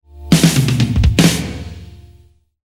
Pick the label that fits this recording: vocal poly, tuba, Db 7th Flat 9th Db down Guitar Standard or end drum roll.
end drum roll